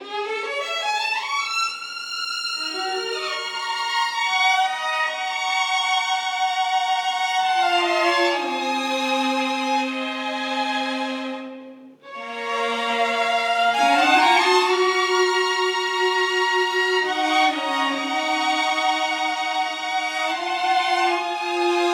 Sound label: string phrase soaring Gb